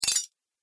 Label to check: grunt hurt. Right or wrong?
Wrong